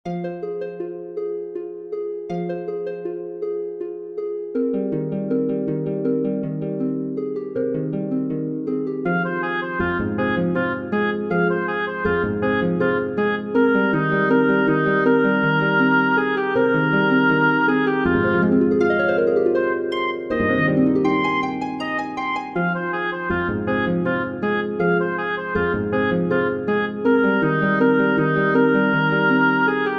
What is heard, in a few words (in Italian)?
DUO PER OBOE ED ARPA